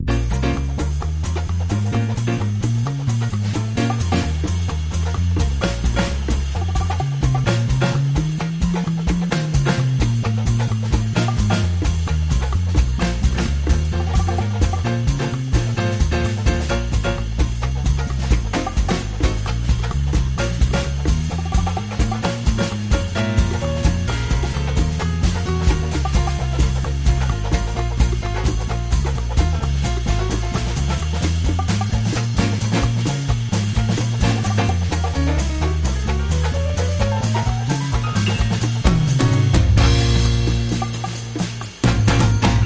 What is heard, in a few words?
Underscore Piano